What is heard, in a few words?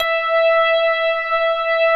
METAL B